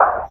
nether footstep; nether-pack

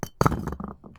brick step; dfcaverns; hunter statue

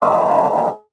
mummy death; tsm pyramids

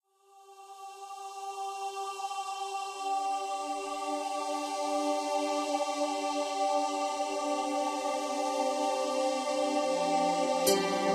eastern feeling, Ambience Musics; server-nalc

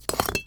brick step; dfcaverns; hunter statue

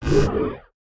hit hunger; server-nalc

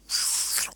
mobs spider; server-nalc

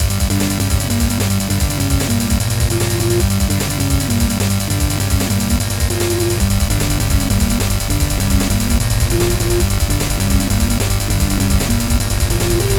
jukebox song; server-nalc

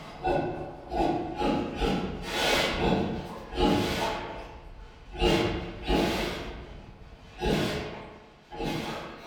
pig grunting grumbling; ambience; dfcaverns

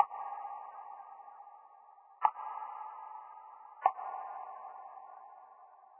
Spooky Water Drops; ambience

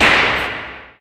nether dug; server-nalc

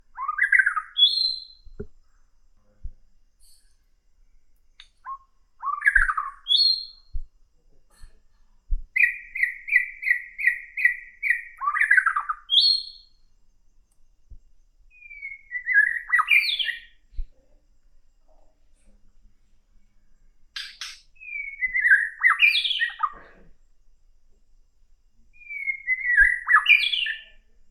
jungle bird; dfcaverns; df ambience

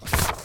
scroll

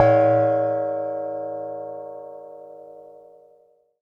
seal key; dfcaverns; underworld

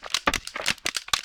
tree monster; server-nalc

